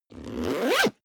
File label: zipper backpack close accelerate